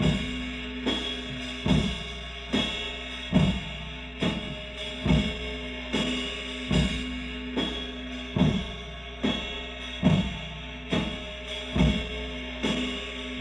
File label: Chamberlin Rhythmate Loop, Drum Machines